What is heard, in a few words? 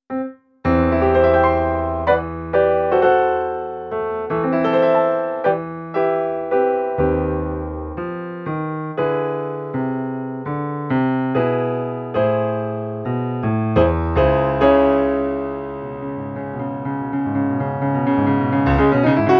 Beat, Clavia NordStage; grandlady su nordstage